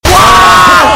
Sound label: scream; vanoss